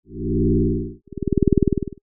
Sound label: 020Hz